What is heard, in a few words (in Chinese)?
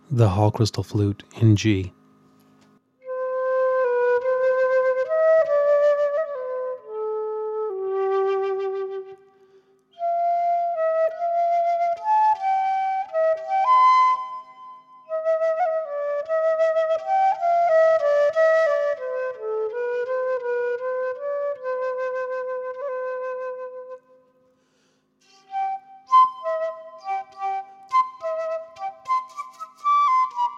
水晶长笛G调